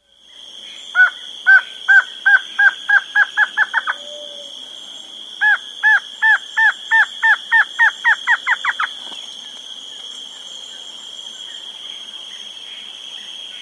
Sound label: Northern Slaty-Antshrike, S. America